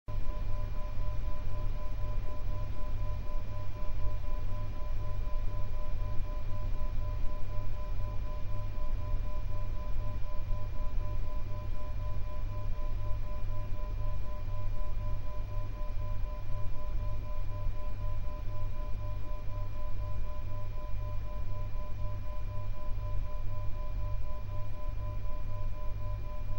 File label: binaural; obe